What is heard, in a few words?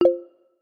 alert